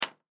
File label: Pool Chalk Set Down, Pool Chalk Set Down Chalk; SoundEffects-Blastwave; Sports